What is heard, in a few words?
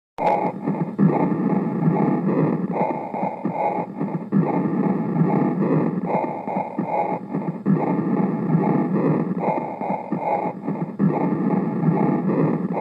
Pectoriloqia; simulatorvzorky